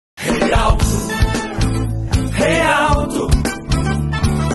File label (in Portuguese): jingle